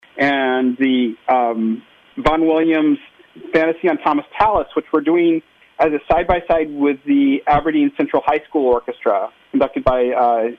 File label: Birthday Concert